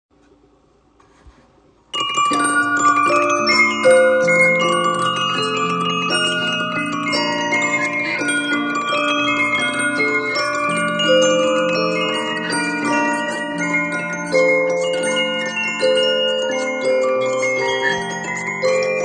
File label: bohemian polka